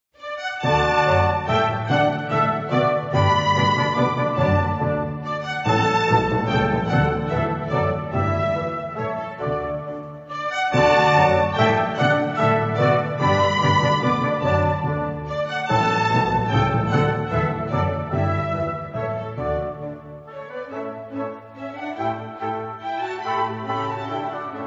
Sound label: Deutscher Tanz No. 1 (German Dance)